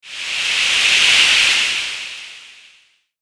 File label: element fire; maoudamashii